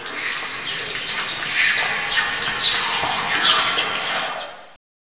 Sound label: spooky